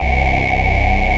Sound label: VOX PULSER, DANCE; Pro-Rec